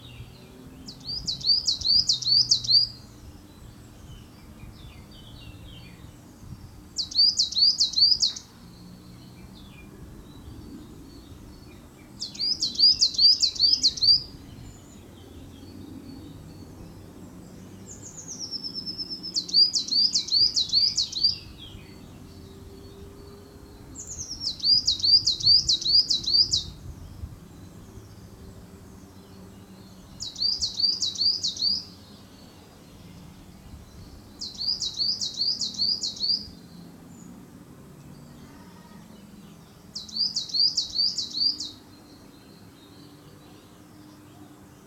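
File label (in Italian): canto verso cincia mora parus ater coal tit tannenmeise carbonero garrapinos; bioacustica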